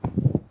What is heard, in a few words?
footsteps mat walk